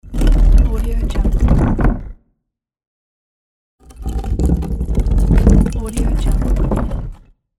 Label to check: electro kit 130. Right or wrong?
Wrong